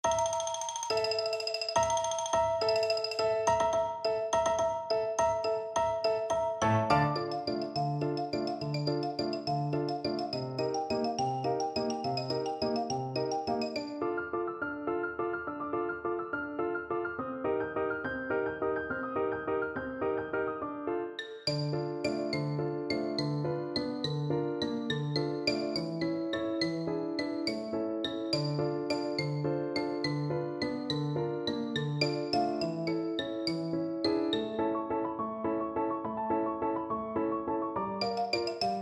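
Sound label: danse, xylophone; percussion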